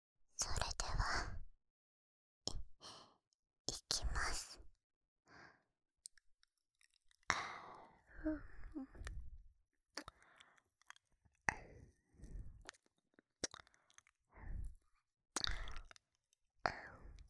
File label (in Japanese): 癒しの甘噛み・耳舐め, ASMR